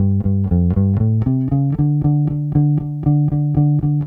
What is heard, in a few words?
Bass, 70s Analog Funk